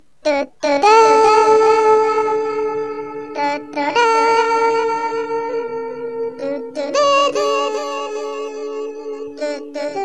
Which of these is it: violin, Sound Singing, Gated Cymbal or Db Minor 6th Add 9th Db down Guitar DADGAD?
Sound Singing